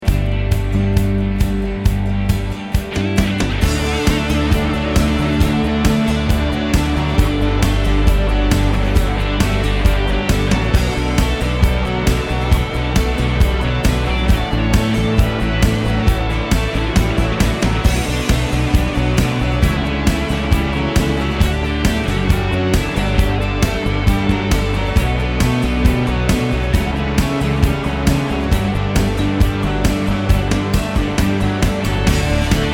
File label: Guitar Rise